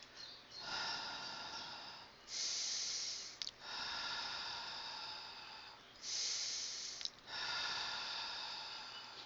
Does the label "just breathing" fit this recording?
Yes